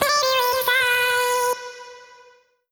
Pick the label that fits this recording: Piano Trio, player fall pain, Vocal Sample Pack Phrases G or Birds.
Vocal Sample Pack Phrases G